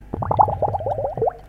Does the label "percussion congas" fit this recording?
No